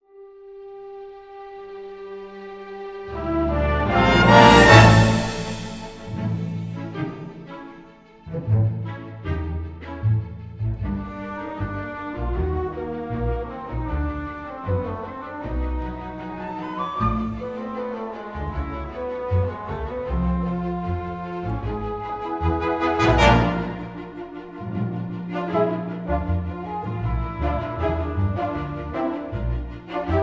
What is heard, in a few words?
Comedy Car Chase Music Only; DreamPlay